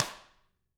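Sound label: tama stick; ILIO; Double Platinum Drums 1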